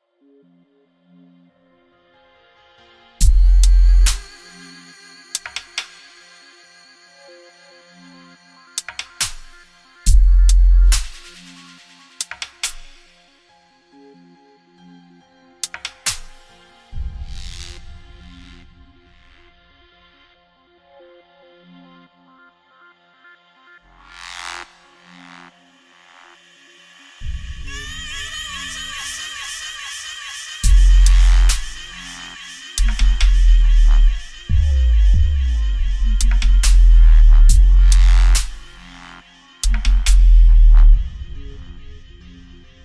deep bass music bass boosted song

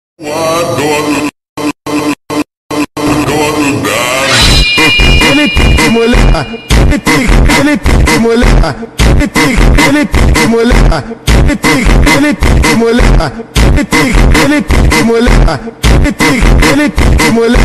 slowed

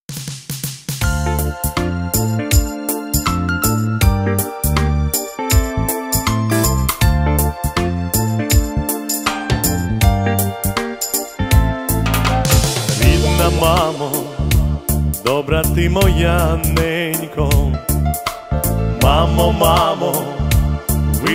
cover наживо ketron event yamaha modx7

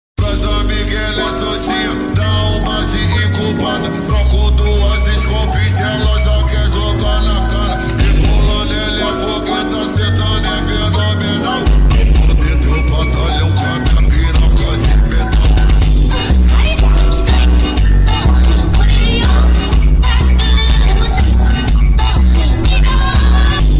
brazilian funk remix slowed reverb

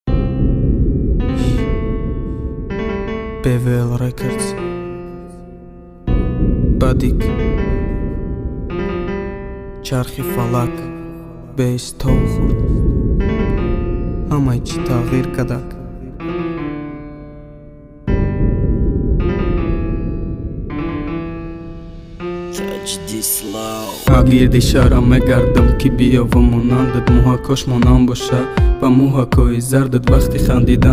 new rap

